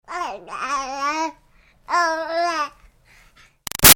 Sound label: Baby 6 Month Old Talk Garbled; Babies